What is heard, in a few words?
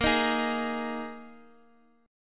A sharp 7th Suspended 4th A sharp